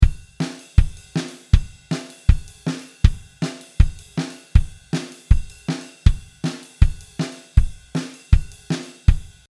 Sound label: kit close overheads blend; Drum Miking Examples